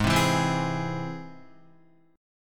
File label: Ab Augmented Ab Guitar; Ab+ strummed; JGuitar